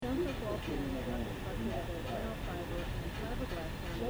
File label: ceiling tiles